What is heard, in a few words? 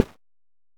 Soft Impact; AutoHand; EmergencyEvacuation